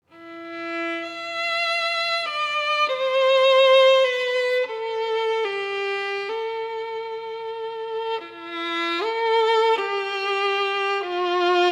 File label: pastorale for violin